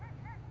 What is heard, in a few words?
bark, Dog; Barkopedia Dog Sex Classification